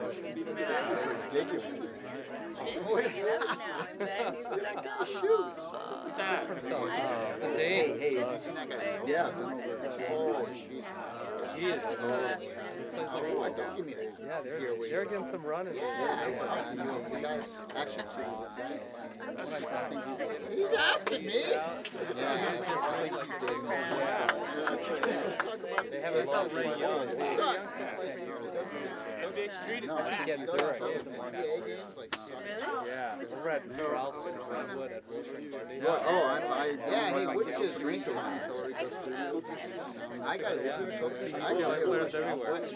crowds; Street Hoops; XBOX

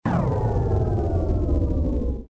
power down; Soldier Of Fortune II Double Helix; XBOX

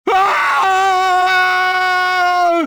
writhe, pain death, male

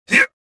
jump; Star Wars Jedi Knight II Jedi Outcast; XBOX; weequay

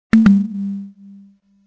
imprtant item; Pirates Of The Caribbean

